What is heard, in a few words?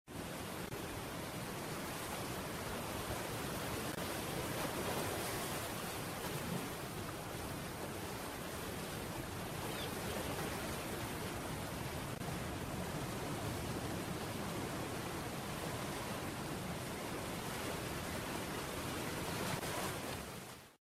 ocean; Minecraft; Votive 1.21.1; Votive Content